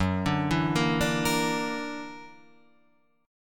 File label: Gb Augmented 7th Gb down; Gb+7 arpeggiated